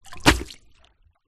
industrial plastic bucket empty land in deep water; zapsplat